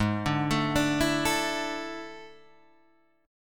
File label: A Major 7th Suspended 4th Ab; AM7sus4/Ab; arpeggiated; JGuitar